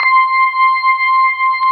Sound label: B3 HAM, Keyboards Old School